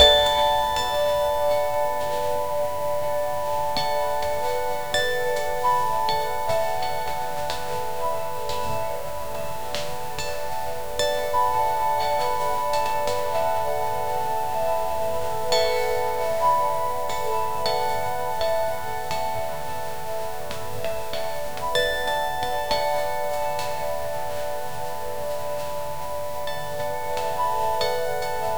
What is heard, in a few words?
white and pink noise mixe